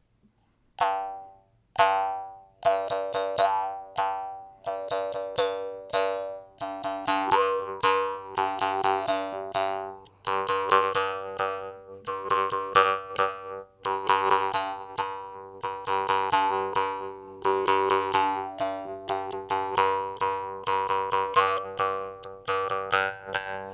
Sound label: genggong